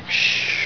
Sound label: shh